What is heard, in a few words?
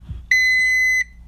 Node Power On